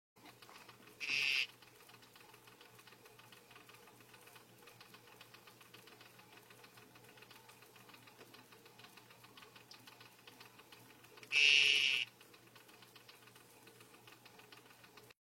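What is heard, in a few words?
D. t. patricia calling, frog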